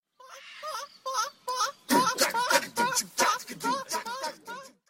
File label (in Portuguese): risada